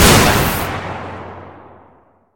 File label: nex fire